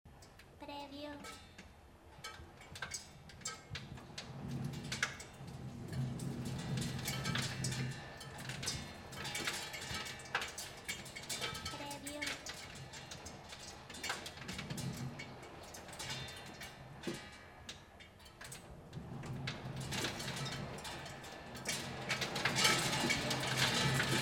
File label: Hail Rain under Roof